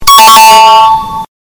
loud correct

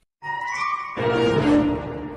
suspense

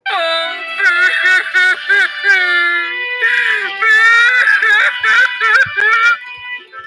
banshee crying

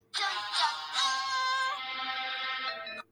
dun dun dun; hugo

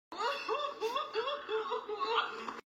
crying; little miss splendid

